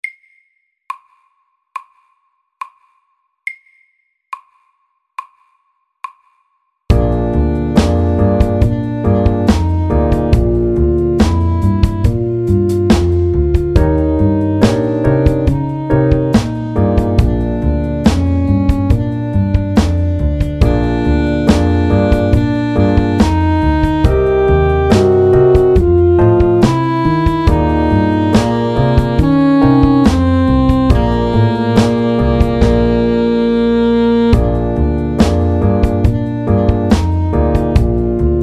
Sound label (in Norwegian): Kromatisk blues SAKTE